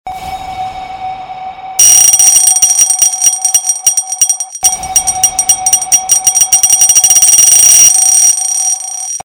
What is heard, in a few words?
sonar alarm ring